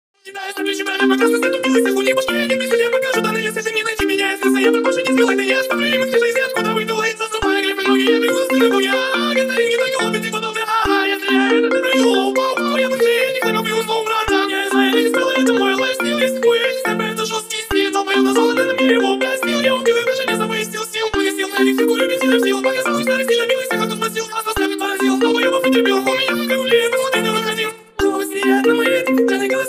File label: iphone ringtone mashup